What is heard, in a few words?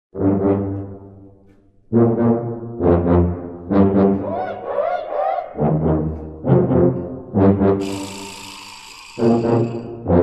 Marching Band Tubas; WVU Marching Band; 2001 Season Highlights